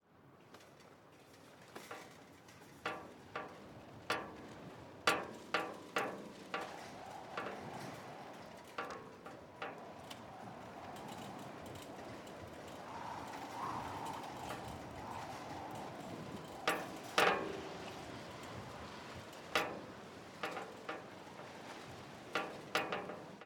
Wind, Ext, Urban, Metal, Signpost, Distinct clanking, Howling, Nervous, Mono; Hzandbits